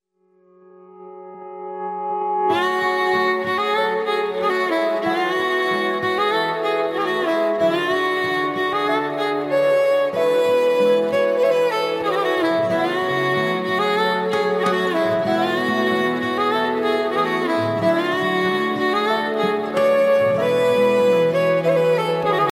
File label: bollywood violin ringtone